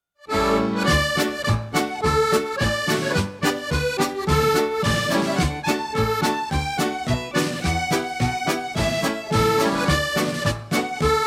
scottish dance band

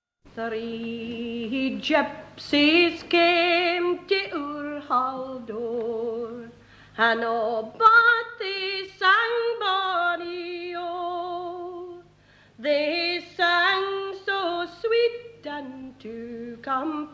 scots ballad

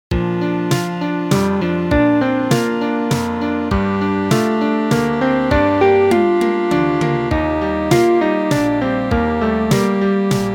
beats

